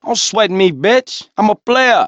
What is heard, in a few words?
dance; tf; cj